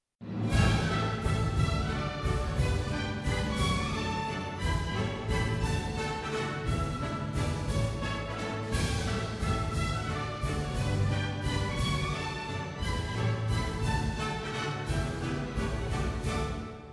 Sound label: Mazurka; Coppelia